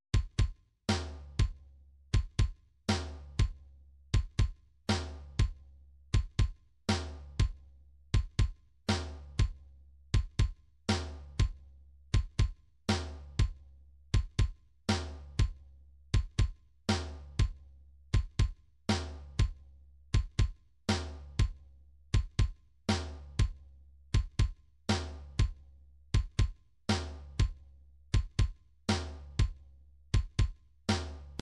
drums, midi drum patterns

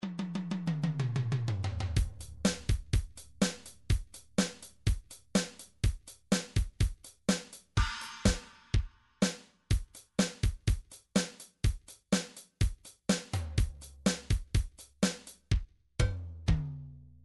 midi drum patterns drums 80s pop

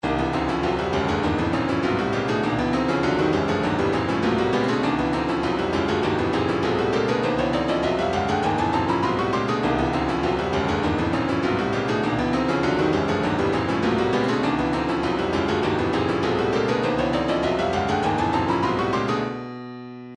retro funk 100, midi; wow

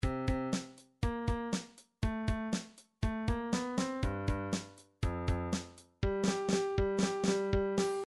fill, midi

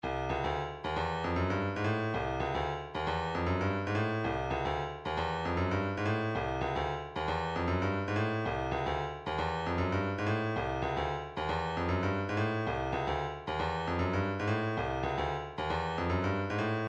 hi hat, midi

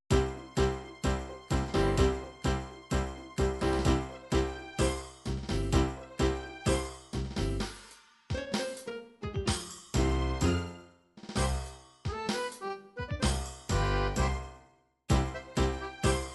tango, midi

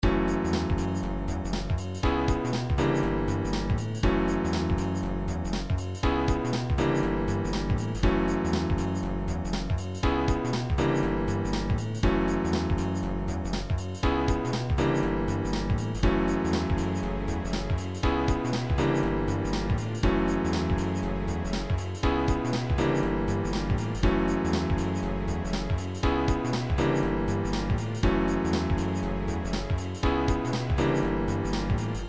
jazzy

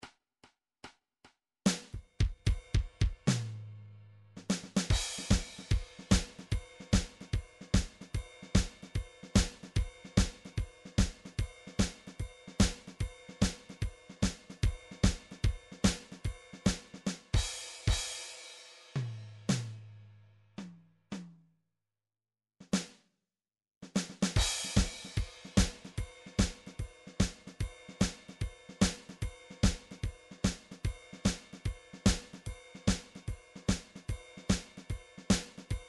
blues

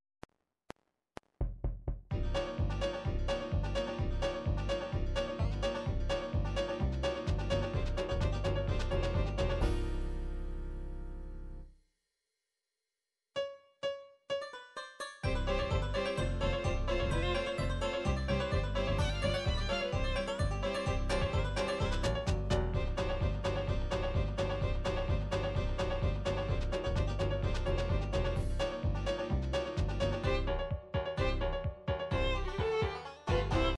hoedown

